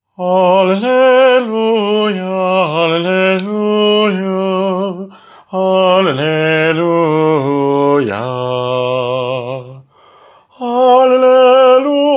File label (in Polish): SOPRAN